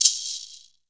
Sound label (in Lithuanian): marakasas